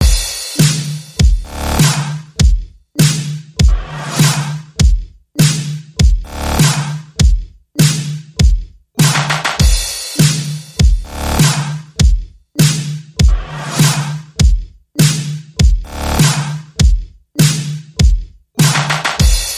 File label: Beat